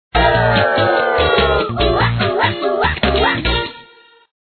ringtone; trollz

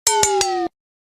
iphone low battery

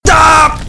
scream; vanoss

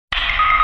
fork knife death

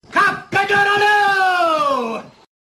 rooster imitation

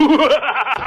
hoohoohahahaha

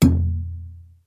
old ubuntu exclamation sound

